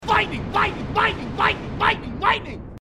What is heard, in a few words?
Lightning